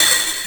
SLEIGH BELL, Hip Hop Misc Perc; E-MU Producer Series Vol. 8 – Platinum Phatt

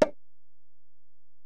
Ac Perc, Drum Machines Alesis DM-PRO

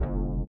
LIQUITAR; Spectrasonics; Metamorphosis

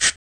RSS PERC

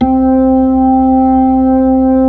B-3 Club Xfade, Keyboards of The 60's and 70's

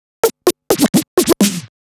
Scratch Fills 140BPM

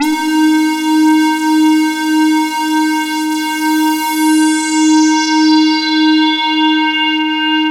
LUCKY SWP, SYNTH; Keith Emerson Signature Series

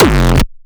SB hard style

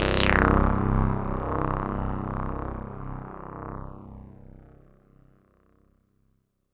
synth note, Fmin; Big Fish Audio; Hardcore Breakbeat Action; Takin It Deeper

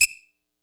kit, Yamaha Motif-ES8

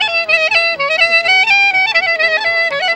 CLARI GROOVE; Best Service; World Colours

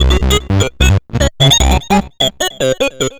Bass, Futurist Drum & Bass

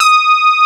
SB HORN; Wizoo - Powered Nord; STEREO LAYER

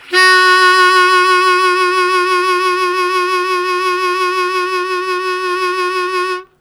Strong Vib Sustain F#1, Harmonica; Zero G

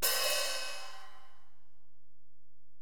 HiHat, Alesis DM-PRO

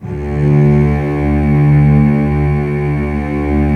D1 low, lush strings; InVision Interactive; Lightware VOL-1; Instruments & Percussions

